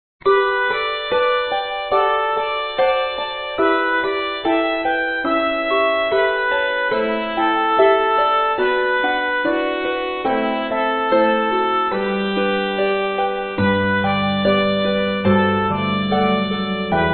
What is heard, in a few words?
C tpt 2 harps organ; macdowell to a wild rose